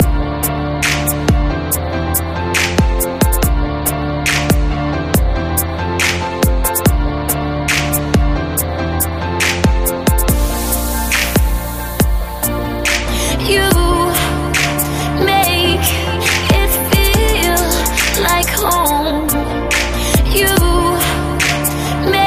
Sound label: TRAP Remix Extended